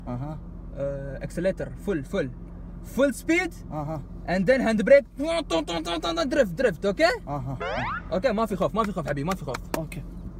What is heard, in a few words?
full speed then handbrake; audio meme